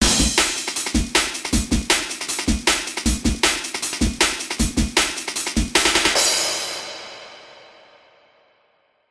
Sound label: Fight Outro; Transformers: Revenge of the Fallen; PS3; Windows; Xbox 360